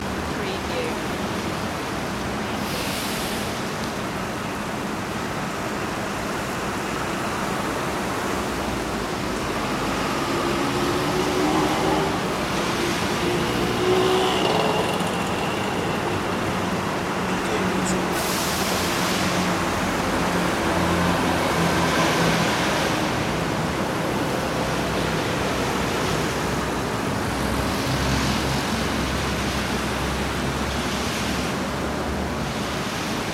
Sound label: rainy city chaos; the sound pack tree